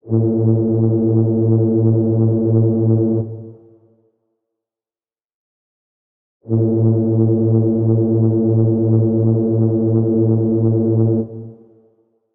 Ship Horn Blast

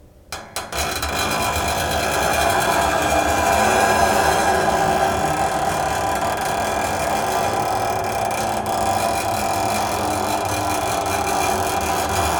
Scrapes

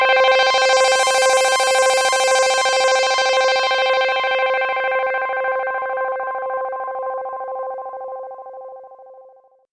Synths

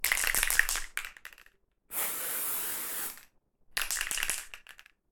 Spray Can Shake And Spray

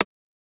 Button Clicking Single

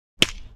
Slap With Reverb

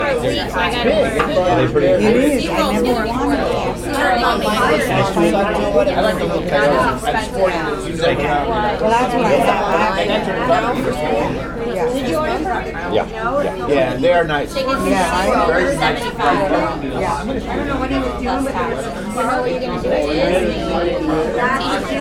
Room Full Of People Talking

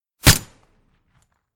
Gun Silencer

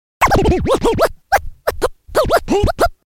Dj Puzzle Scratch

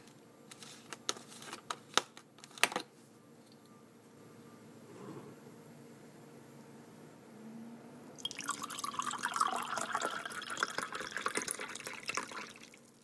Pouring Juice

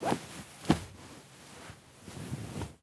Rustling Duvet

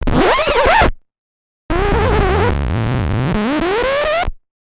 Record Scratch

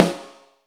Snare

